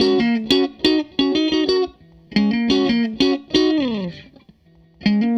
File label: Strat Guitar Loop